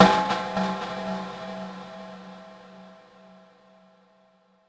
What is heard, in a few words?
Metal Drop; Zero-G; Soundclash Nu Dance Electro; Acidized Wavs; FX Atmospheres